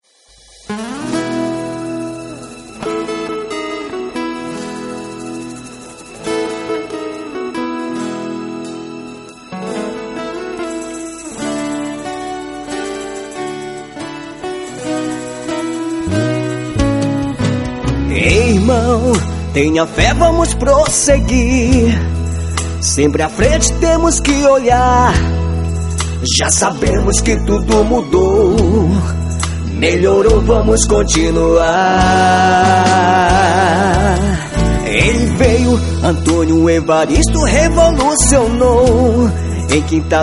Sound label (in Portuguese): Jingle politico